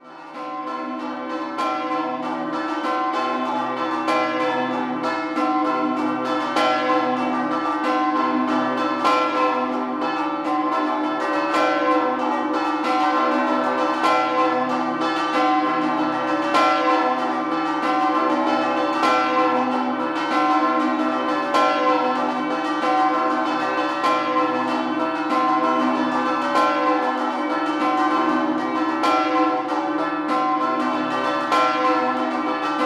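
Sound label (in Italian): NIMIS San Gervasio, campane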